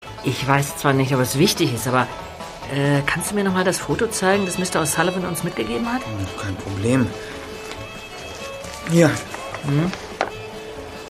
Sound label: texas stomp